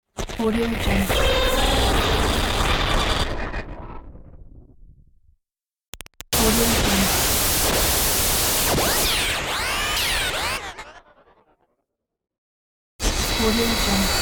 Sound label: glitch sample series